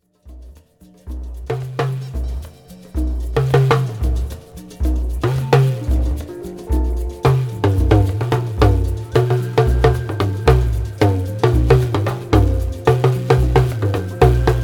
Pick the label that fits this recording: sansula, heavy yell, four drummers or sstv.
four drummers